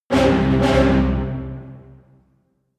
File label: jingle brass threatening rhythmic hit